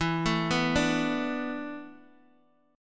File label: E 7th Suspended 4th, Guitar, DADGAD